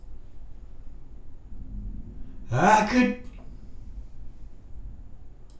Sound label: vocals